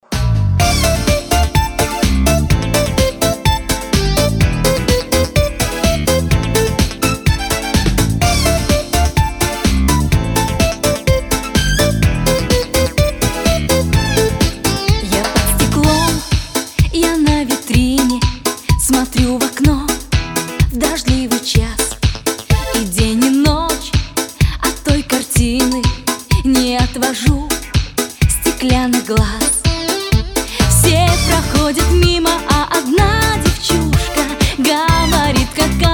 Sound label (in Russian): detskie pesni